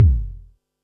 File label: Bassdrum, Pearl SC-40